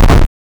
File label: hit damage in videogame, combat